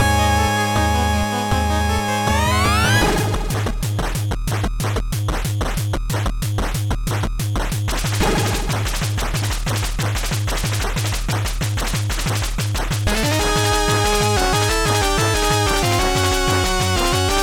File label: 8Bit; DPPt